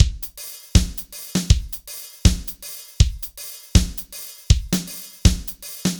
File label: BPM 80 AMB